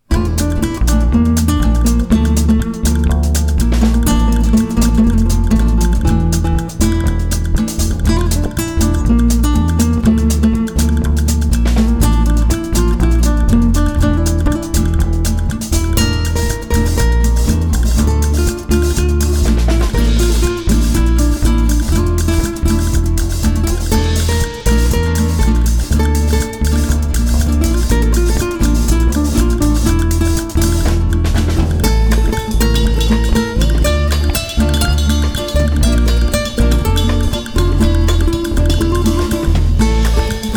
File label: iwato